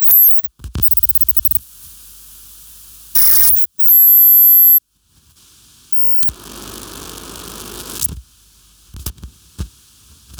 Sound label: reel to reel static improvisation